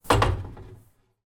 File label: household swimming pool metal pole net lean against wall